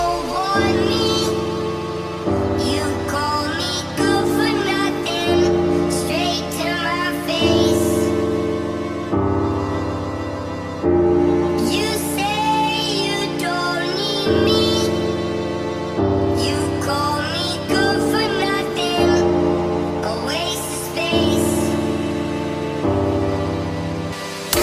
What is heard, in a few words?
Phonk ringtone